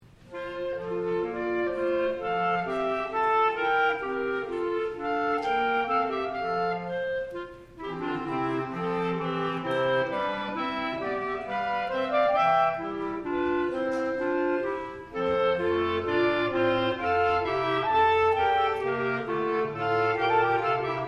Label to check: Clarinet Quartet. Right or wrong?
Right